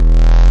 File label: Evil Bass Sounds, bass hit; multisampled; Desecrated Productions